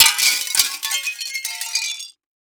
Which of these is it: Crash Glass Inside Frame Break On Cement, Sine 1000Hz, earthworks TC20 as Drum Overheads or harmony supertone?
Crash Glass Inside Frame Break On Cement